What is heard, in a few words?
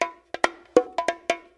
bongo